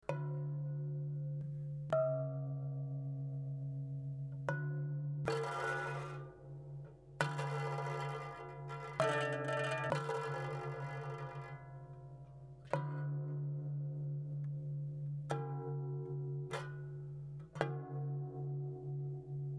pince, harpe

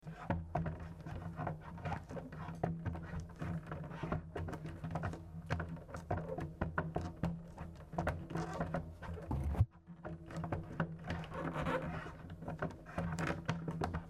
deplacement, harpe

